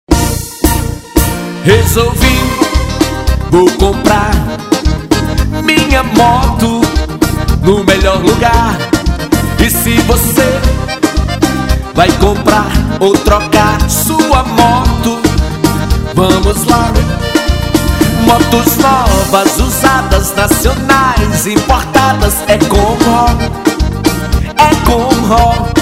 jingle; ro de miguel moto

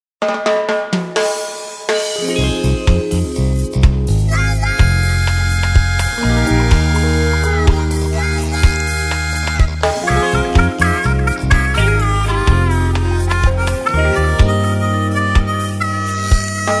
marimba ska blues reggae music

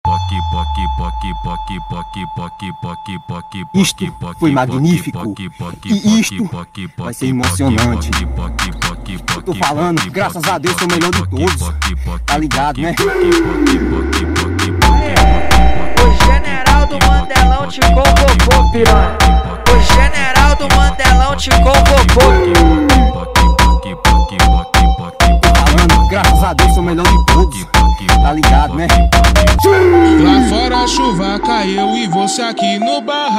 beat; luva de pedreiro

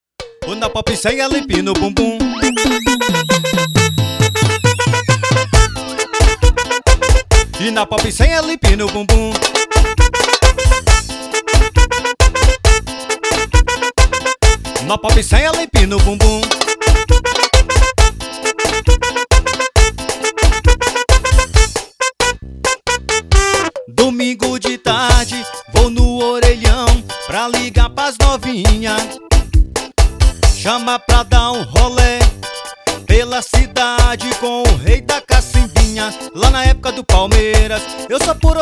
pop

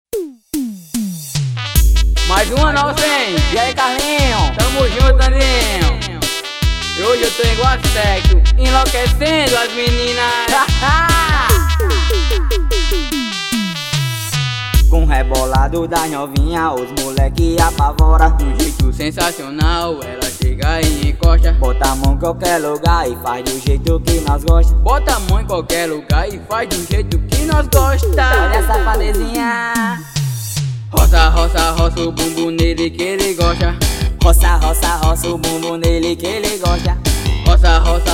swing

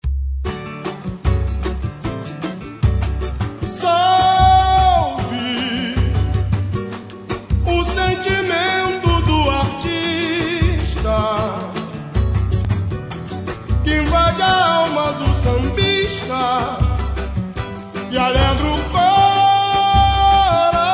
interlud

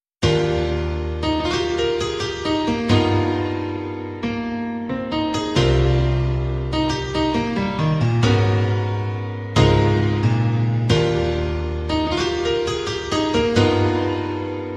beat

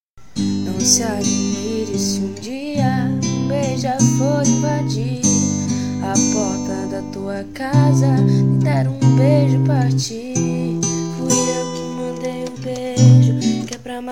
cover at aeroporto internacional de salvador; aeroporto internacional de salvador ssa

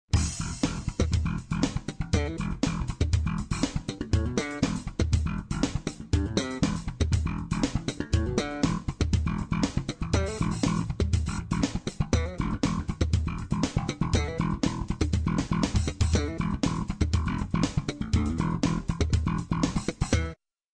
samba funk solo bass

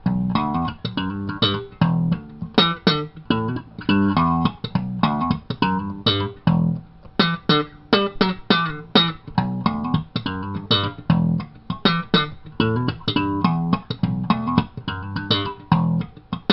slap groove

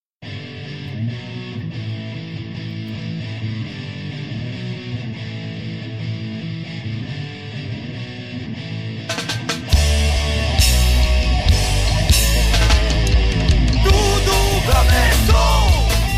rock; rock do porao

